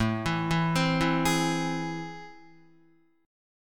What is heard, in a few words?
A Minor Major 7th, down, Guitar; arpeggiated; AmM7; JGuitar